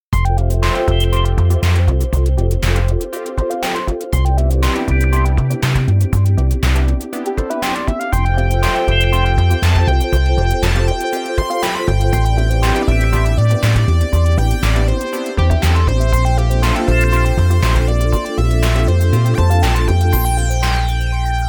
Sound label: minimog; instruments; minimogueva